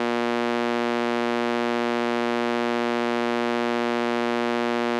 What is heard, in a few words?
buzzer 119Hz; emu